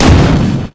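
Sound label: super shotgun; quake2; railz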